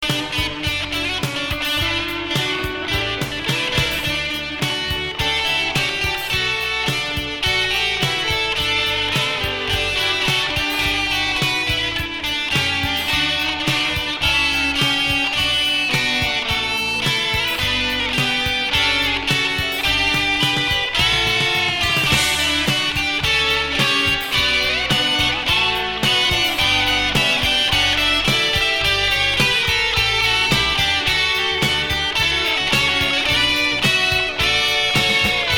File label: Wonderland Solo